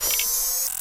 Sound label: sniper reload; svencoop; hl2